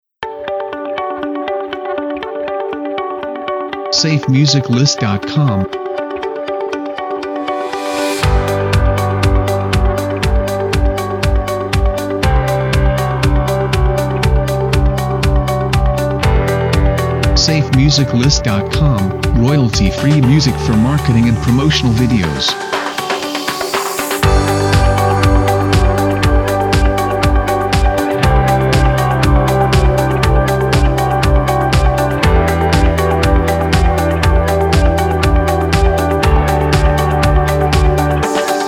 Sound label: Positive News; SafeMusicList